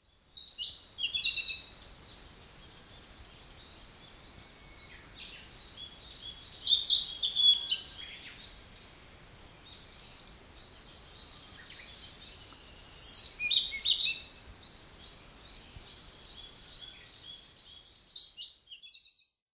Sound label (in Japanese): kibitaki